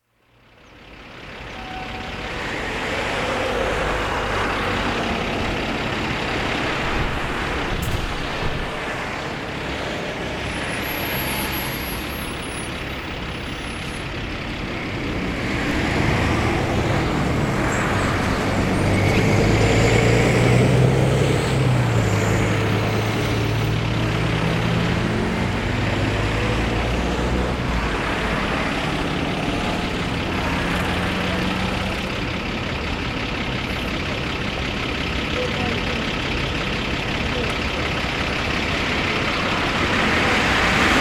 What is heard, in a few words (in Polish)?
Ruch Uliczny, traffic street